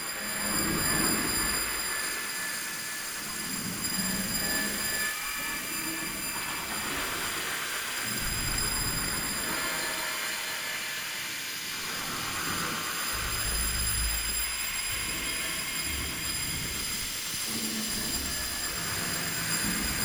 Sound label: Washy Whistle Ambience - Loop; The Sound Pack Tree; Unreal Ambiences